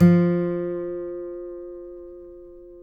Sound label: F, guitars; Spectrasonics; Hans Zimmer Guitars VOL-2; FNGSTYLE PFM